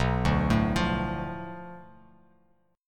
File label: B Minor 7th Flat 5th B Bass Standard, chord; Bm7b5 arpeggiated; 7,5,7,10; JGuitar